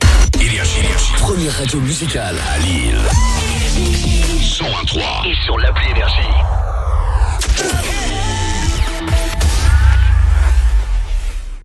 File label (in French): JINGLE, Première Radio; LILLE, 101.3 FM; NRJ